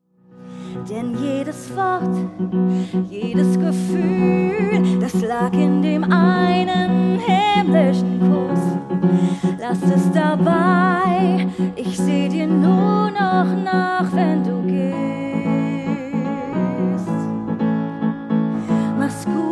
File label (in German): Gesang; Fremdtake